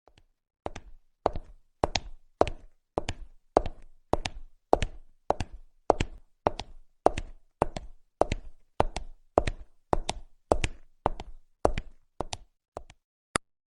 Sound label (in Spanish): sonido caminar tacones; personas